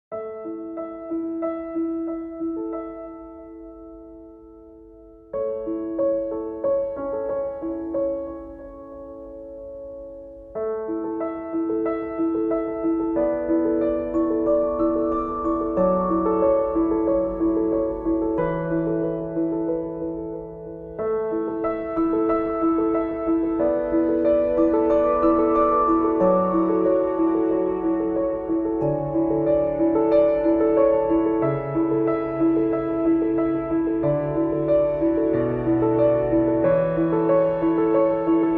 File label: TV Score Piano; Schmidt Chaos auf Rezept; RTL